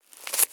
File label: Arrow Impact Pull Out; Game Weapons; Military; Game Essentials